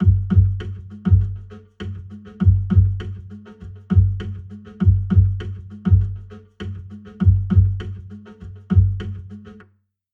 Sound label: Hip Hop Drums Acoustic Wet; PerfectSpace